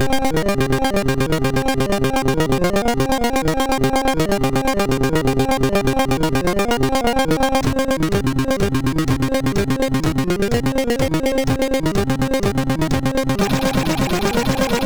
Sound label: sid MOS6581R2